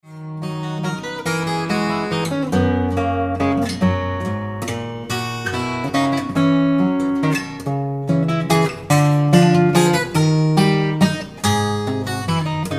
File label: Waltz